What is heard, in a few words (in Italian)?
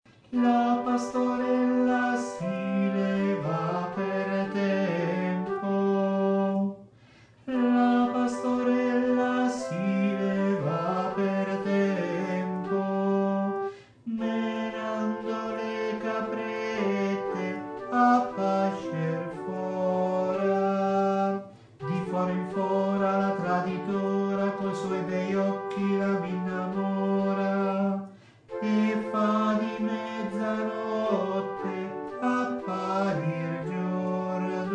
Pastorella Alti